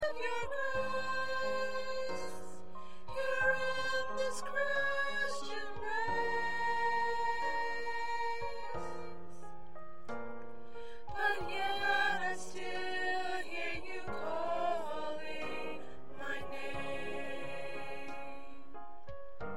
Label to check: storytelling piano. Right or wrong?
Wrong